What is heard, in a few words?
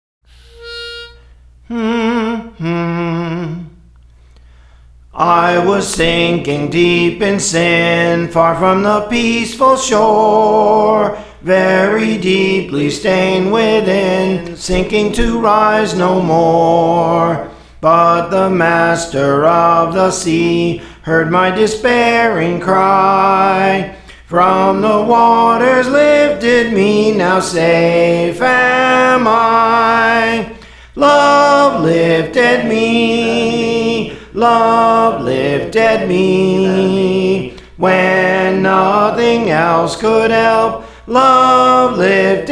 Soprano, Songs; Praise For The Lord Training; Love Lifted Me